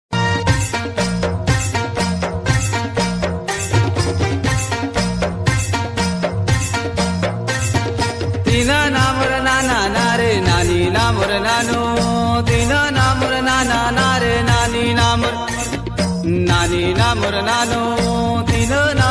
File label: gondi song